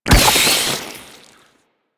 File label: Infested Face Hugger Death; Warframe